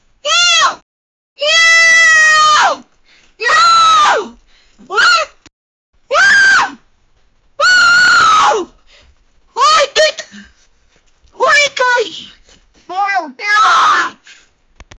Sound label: a frustrated woman yells; emotional